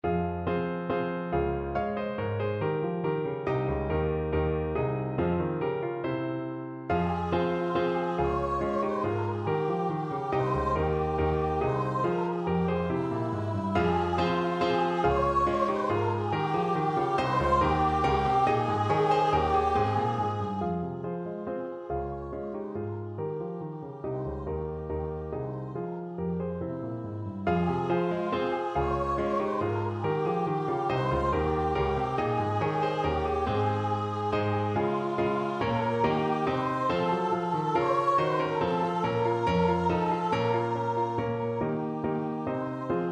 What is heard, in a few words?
2 VOICE